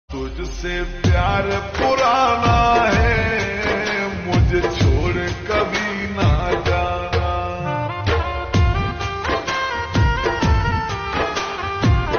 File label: Ringtone Slowed Reverb